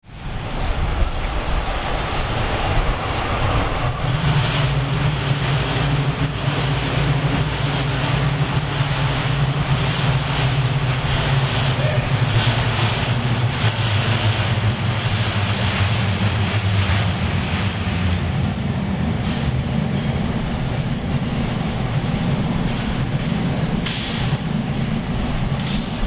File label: koenji tokyo outside